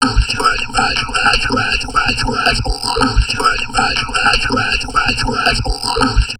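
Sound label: talk, drum loops crazy processed, 160 bpm; USB Soundscan vol.07; AKAI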